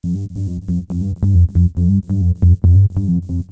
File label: House Riff Bass